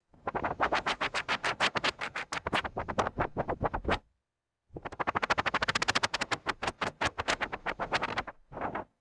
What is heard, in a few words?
slow resonant paper bending and wobbling